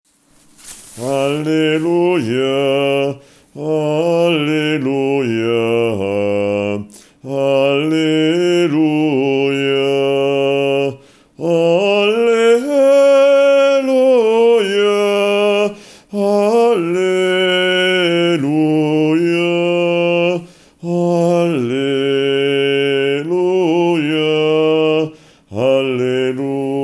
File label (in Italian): soprani; Alleluia Frisina